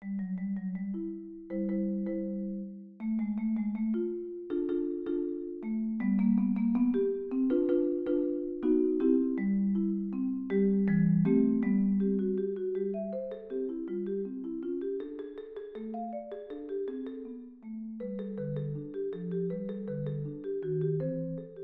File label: Marimba